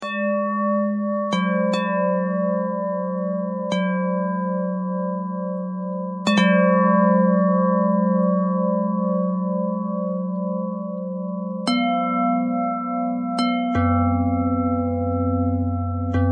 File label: bell thread pentatonic